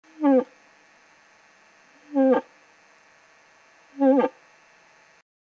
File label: Old Bull Territorial Call, Moose